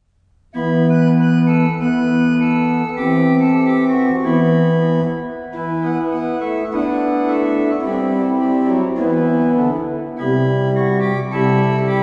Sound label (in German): orgelvorspiel; Komm, heilger Geist